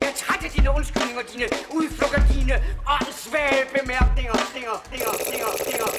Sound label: loop 120bpm